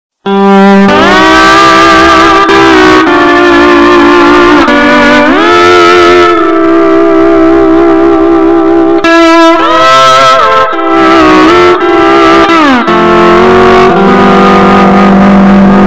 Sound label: sad earrape; spongebob